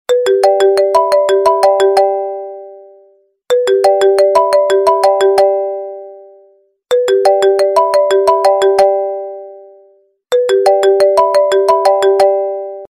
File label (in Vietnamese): nhacchuong iphone 8 original